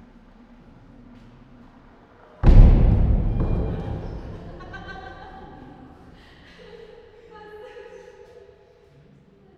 döngés és nevetés, majomház; allathangok; veszpremizoo 2013

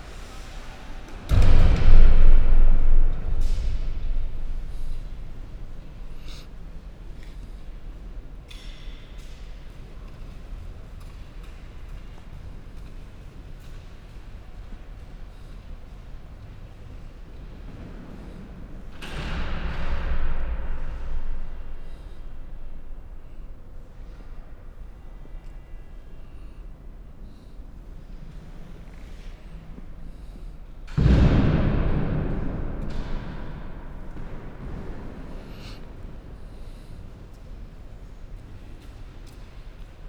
oldalajto tobbszori csapodasa; bagrati

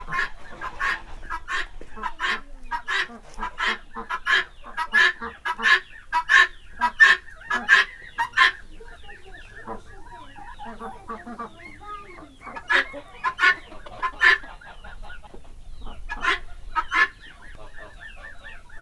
kacsa liba, gyongytyuk; állathangok; paraszt udvar

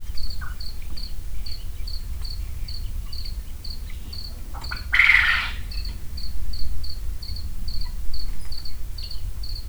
tucsok, furj; állathangok; paraszt udvar

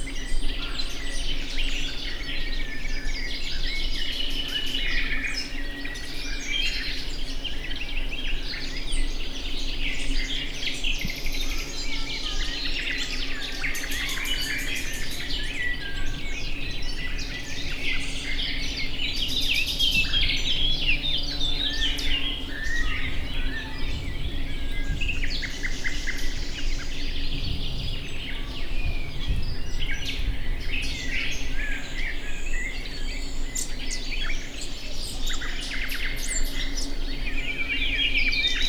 madárhangtól hangos liget; allathangok; termeszetben

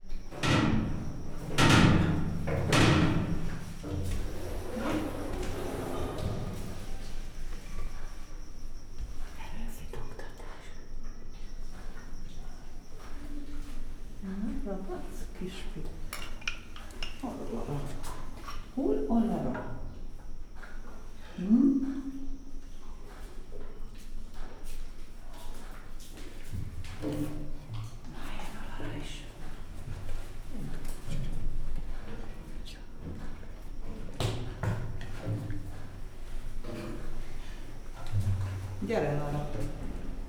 labanak hangja, csimpanz; gyori zoo 2014

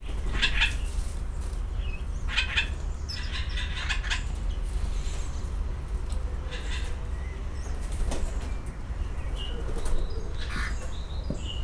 király és egyszínű csőricse; szegedi zoo 2011

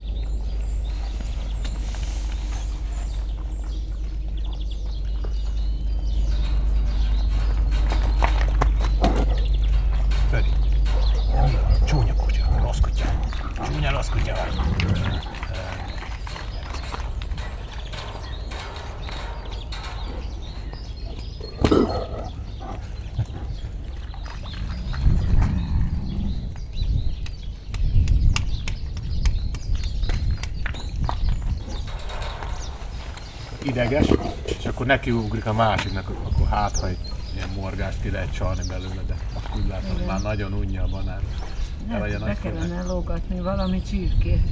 morgolódás, szeles idő, szürke farkas; hortobágyi nemzeti park 2013

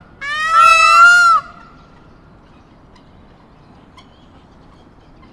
rövid kiáltás, páva; állathangok; nyíregyházi zoo 2010

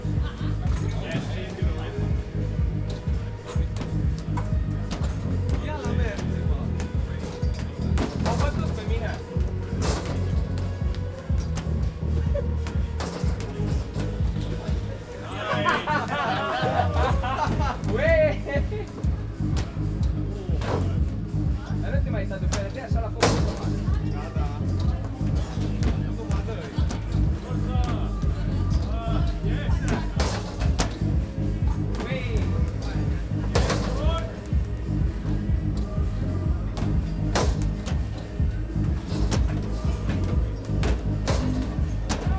kosárlabda nevetésekkel vidámpark

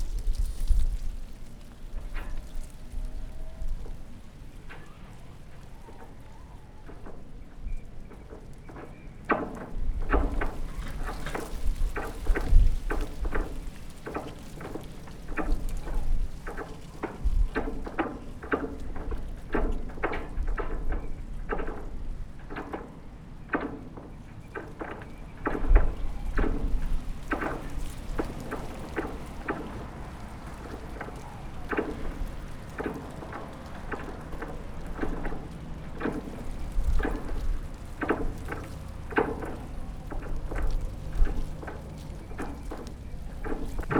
zorgo arbocrud futyulo szel arrecife; lanzarote 2017

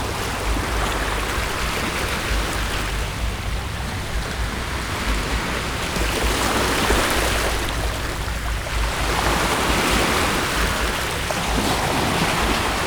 szelid hullamok eszaki resz

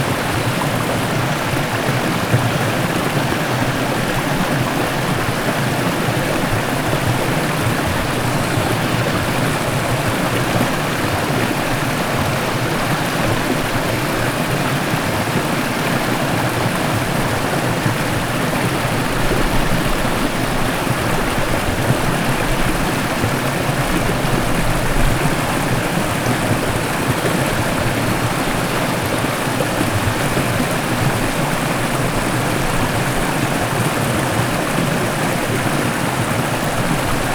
vízesés, patak; visegrad

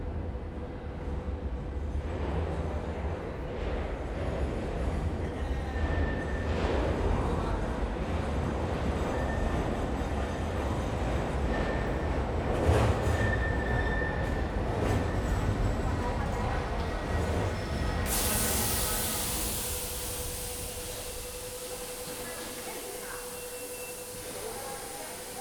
metró deák tér vörösmarty tér, éjszaka budapest; metró 1

